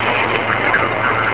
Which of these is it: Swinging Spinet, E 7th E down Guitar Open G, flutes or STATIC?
STATIC